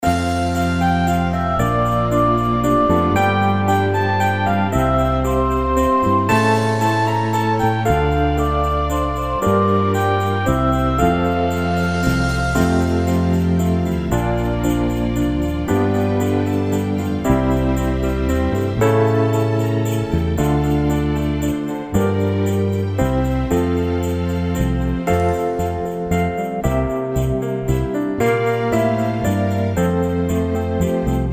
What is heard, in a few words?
instrumental